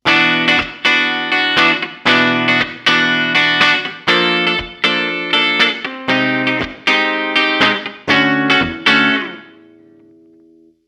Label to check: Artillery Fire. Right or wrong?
Wrong